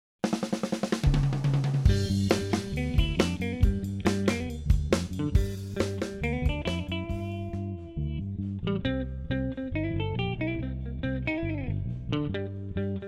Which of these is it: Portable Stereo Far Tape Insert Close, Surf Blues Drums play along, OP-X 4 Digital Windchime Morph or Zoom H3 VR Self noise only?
Surf Blues Drums play along